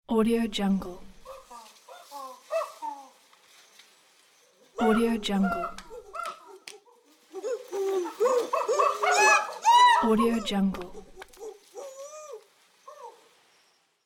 monkeys playing

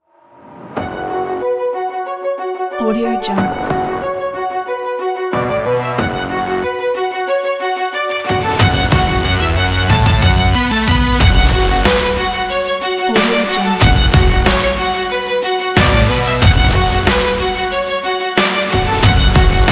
dramatic action sport adventure intro kit

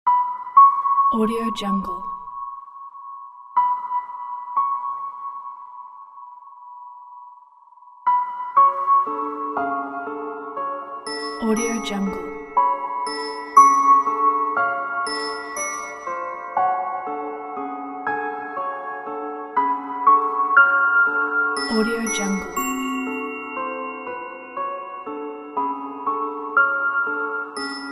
deserted house

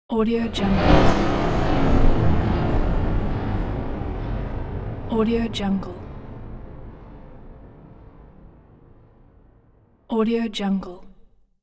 spellcaster of illusion

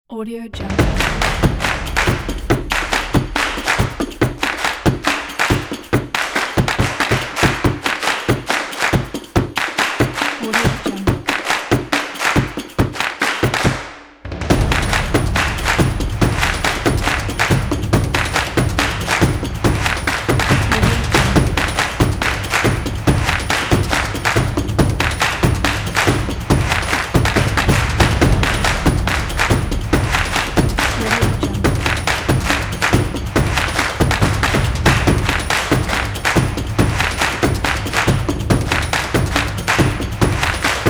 stomps and claps

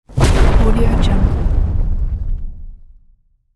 asteroid impact

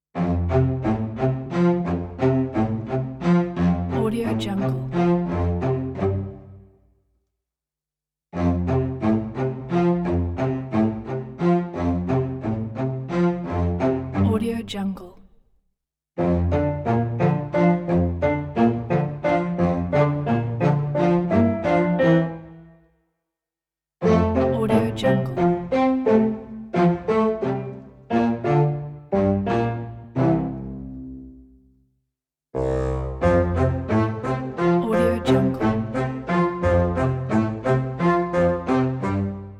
quirky whimsical cello motif for films

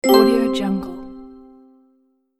success finish

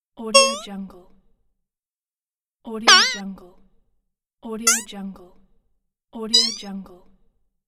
cartooney stings

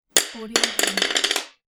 pencil fall on floor; zverev

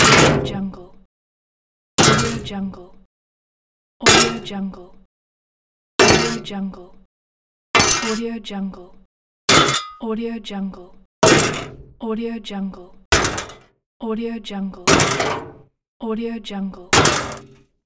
medieval armor hits pack

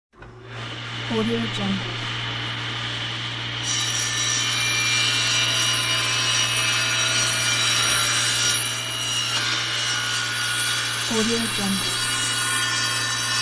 table saw cutting wood; hollywoodedge

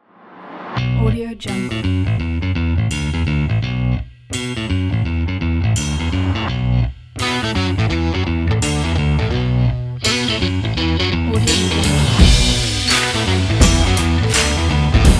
blues

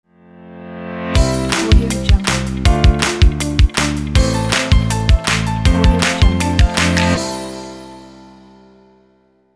corporate elegant upbeat logo

